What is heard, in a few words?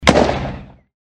Cheat Activated Theme; Dude Theft War; Android